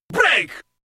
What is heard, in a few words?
Break; Effetti sonori